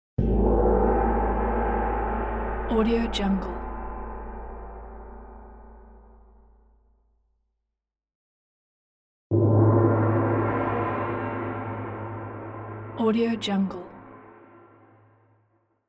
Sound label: gong